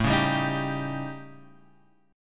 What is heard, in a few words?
A 7th Flat 5th Guitar Open G